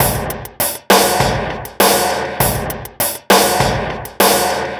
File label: Noise Beat; Drums & Drones